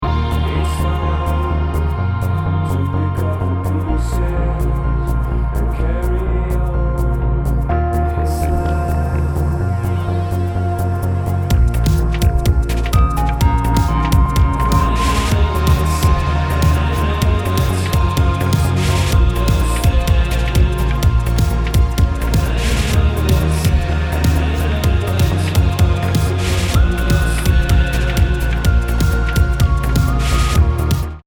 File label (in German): ohne Exiter